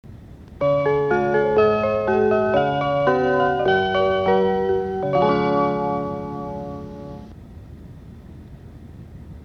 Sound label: e4 joetsu shinkansen chime; higashinihon